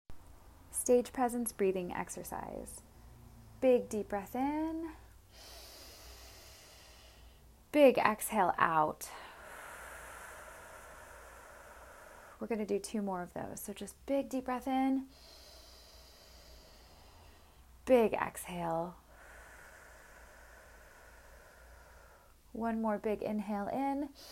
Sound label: Breathing Exercise, Vocal Warm-ups